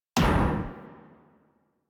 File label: Pulse Laser Mech; Weapons; Warframe; Railjack